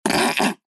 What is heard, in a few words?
poop liquid splat toilet bowl